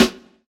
snare drum sample b key, snare drum sound tuned to the B note; snare drum samples